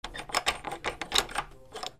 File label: DOORS